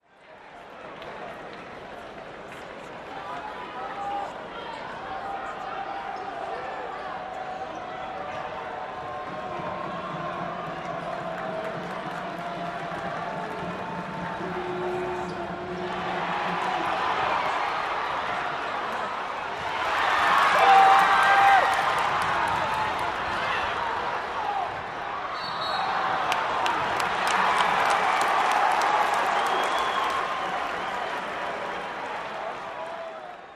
Crowd cheers and applauds during a college basketball game.; Cheer, Crowd Crowds, Gymnasium Sports, Basketball